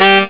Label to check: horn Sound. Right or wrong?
Right